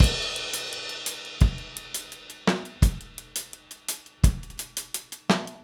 Drums, 70s Analog Funk

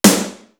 GUNNER, TOM; East West - Global Sounds; D4 BD SD TOM

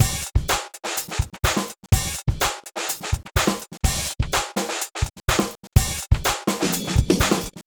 Gated 127, Beat Loops; Zero-G; Monster Beats

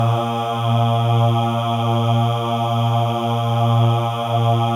MALE AHS, Symphony of Voices; Spectrasonics

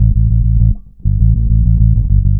Organic Bass; Trip-Hop; Loops; Sonic Foundry (Sony Creative Software); Rondo Brothers Trip-Hop Loops & Samples; El Corazon

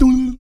VOC PERC, Vocal Planet Jazz FX BASS PERC

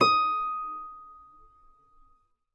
Eb5 HARP; USB Soundscan vol.62; AKAI

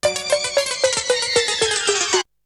Melody loop; ZERO G - Datafile DISC 3

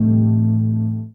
Gong Harp; Sonic Foundry (Sony Creative Software) - Friendly Fire; Single Gongs

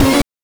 BANK2 SFX, NES DPCM; MIDINES

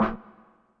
scrub, Yamaha Tenori-On; Scrubkit

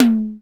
DDD1 TOM1; Back In Time Records; Korg Universe VOL-1; DRUM FAMILY

